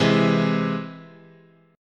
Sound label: C Augmented, chord, guitar